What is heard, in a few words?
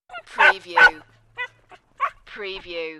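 Yappy Dog 2x Whine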